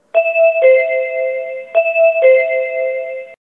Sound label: door close